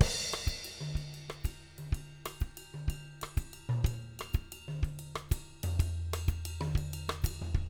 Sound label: 124 LATIN, Living Drums; Sampleheads; Peter Erskine